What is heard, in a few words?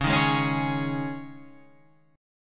C sharp Suspended 2nd C sharp, chord; strummed; 9,6,6,6,9,9